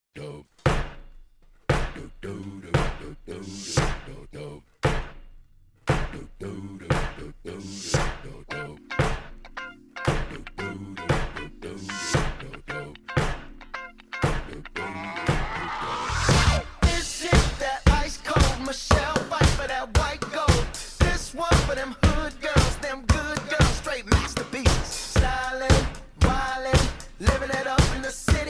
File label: basket beat